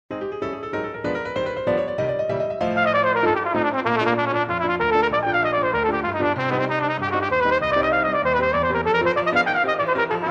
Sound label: Arban Studies